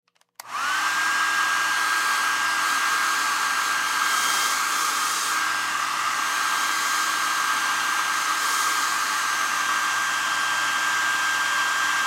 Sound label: Hotel Room Hair Dry, Hair Dryer On Run Off; Foley; Primping; Human